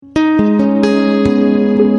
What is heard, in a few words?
guitar sound sms message tone notification